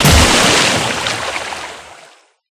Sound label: Dive; life of a naomi; Naomi Carrigan